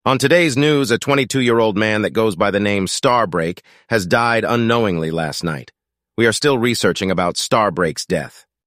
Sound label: a llittllee sillly hahahahhaha